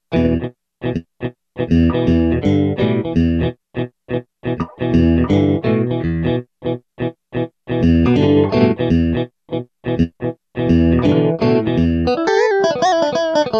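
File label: distortion + reverb hall, Yamaha REX50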